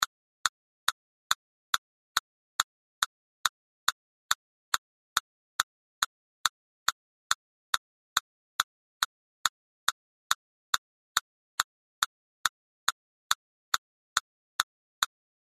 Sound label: Metronome 140